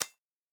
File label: eoka push barrel; Rust